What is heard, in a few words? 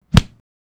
brutal hit